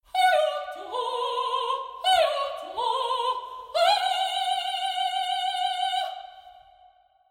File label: singing